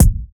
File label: rich high end kick e key; Duration 0:01; kick drum samples